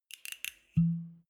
bottle whisky cork pull out creak; gastronomie; berufe

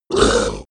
ogre hit; kreaturen

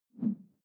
whoosh simple short tonal low; swooshes-swishes

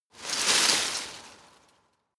scrub small branches hit; haus-garten

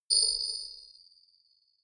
notification metal bing; kommunikation; signale

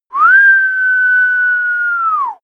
whistle call; koerpergeraeusche; menschen; soundpool

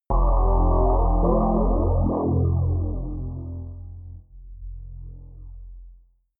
atmospheric synth bounce flanger, drones

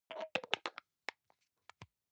hygiene small screw cup close soft, badezimmer

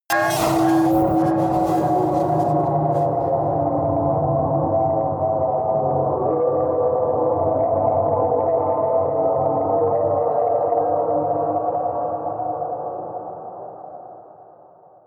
stab electronic rise, drones; musikelemente

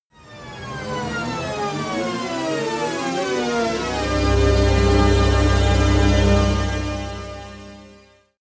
intro orchestra drop; risers

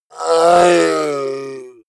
orc call painful long; kreaturen

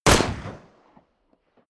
pistol close shot; schusswaffen

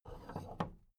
big plastic case handle take; objekte; kiste

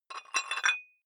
dumbbell thread put on small weight soft; sport freizeit; fitnessstudio

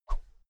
whoosh thin slow

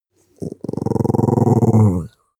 dog small growl regular; natur-tiere; hunde

